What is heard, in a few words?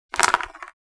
Dice thrown on a casino table rolling